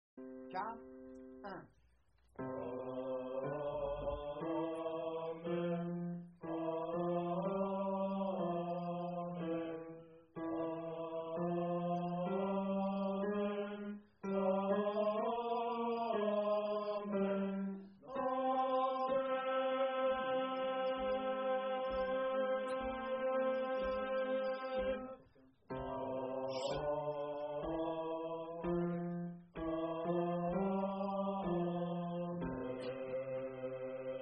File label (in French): basse